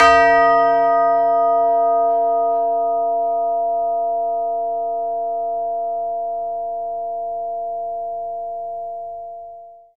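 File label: CHIME C4; ILIO; Synclavier Percussion 2; World & Orchestral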